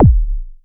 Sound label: Roland SH-09 Bassdrum